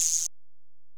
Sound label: EPerc, Alesis DM-PRO